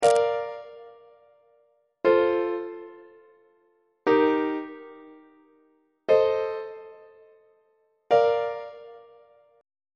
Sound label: b flat major leading tone iac on treble clef